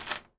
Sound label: Foley